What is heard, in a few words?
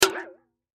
Hit Metallic, Hit Metallic Warble; Cartoon Sound Design; Blastwave